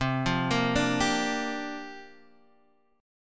C 7th Suspended 2nd Suspended 4th C down Guitar Open D